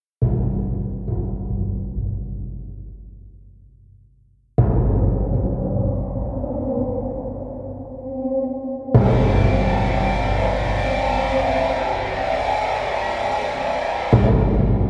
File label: music Caves; Anima ARPG